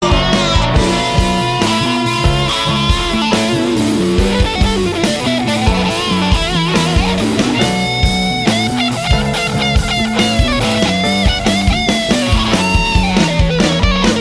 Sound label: Guitar